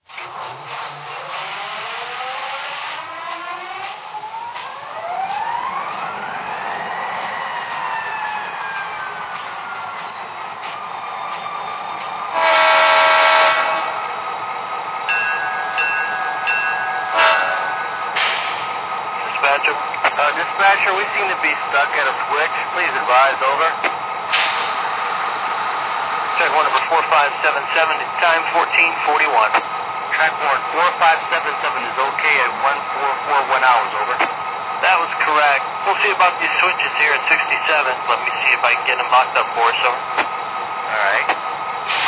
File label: sd50, trains; CNW; DRG